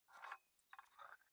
dumbbell turn nut in, fitnessstudio

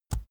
keyboard laptop enter push soft

